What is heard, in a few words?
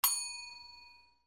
BELLS